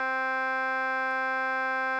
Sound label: Instrument